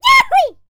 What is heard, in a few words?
shouts; World Voices; YODEL YELL; ILIO; Vocal Planet